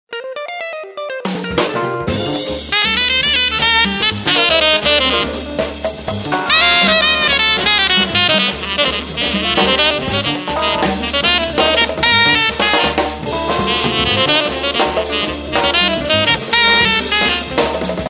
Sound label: Jazz Bar